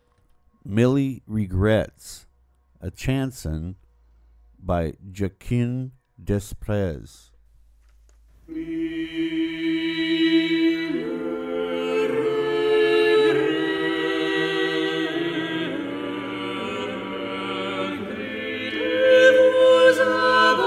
chanson; Josquin des Prez; Mille regretz

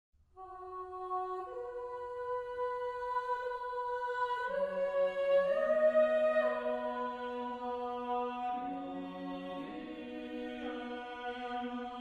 Motet; Josquin

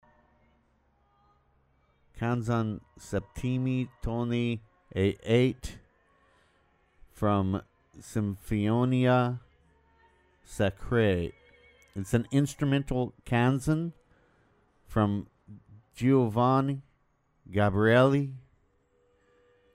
canzona, ensemble; Giovanni Gabrieli; Canzon septimi toni a 8; from Sacrae symphoniae

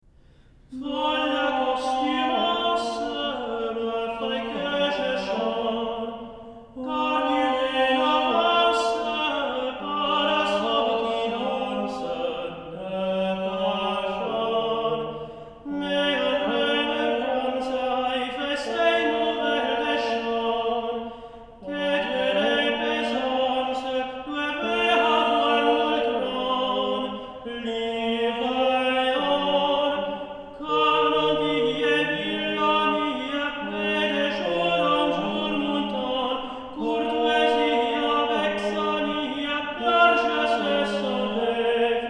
motets on tenor Dominus; fole acostumance dominus

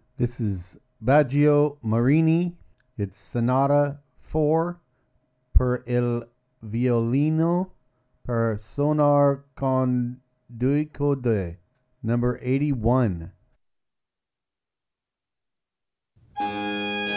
Sonata per il violino per sonar con due corde; Biagio Marini; Sonata IV

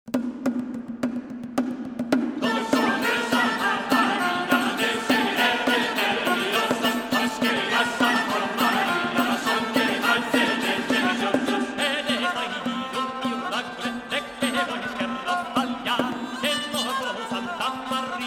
cantiga; cantiga 159; non sofre santa mari

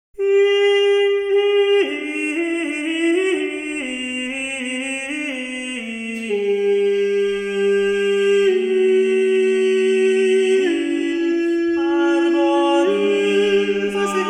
motet; Philippe de Vitry; In arboris Tuba sacre fidei Virgo sum

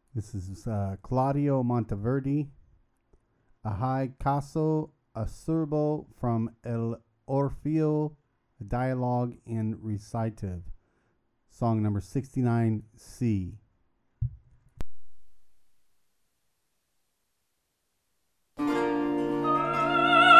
dialogue in recitative; Claudio Monteverdi; Ahi caso acerbo; L'Orfeo

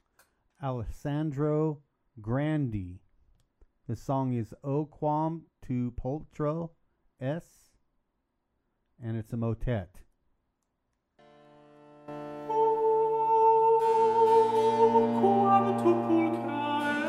motet; Alessandro Grandi; O quam tu pulchra es

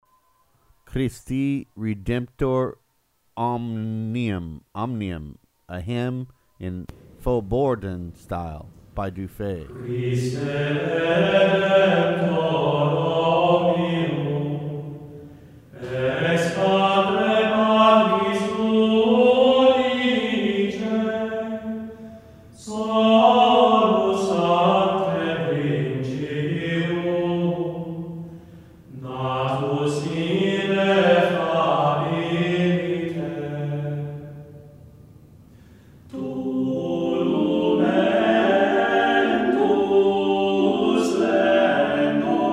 hymn in fauxbourdon style; Guillaume Du Fay; Christe redemptor omnium

